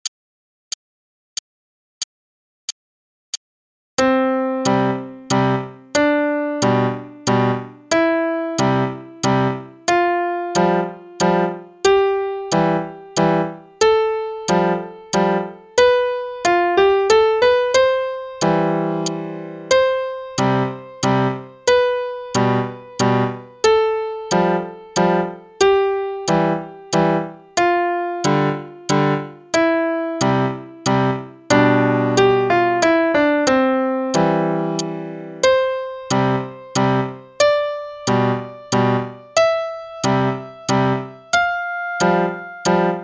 Scale Waltz (qn=92); Faber; Faber Adult All-in-One; Adult Book 1 (All-in-One)

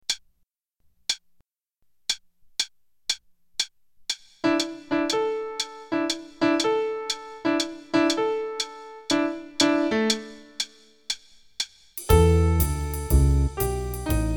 Play a long, intro only, Jazz Improv Play-a-Long

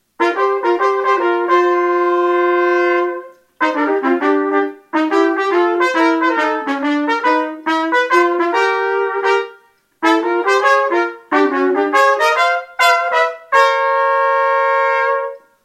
jazz trumpet duet, qn=140

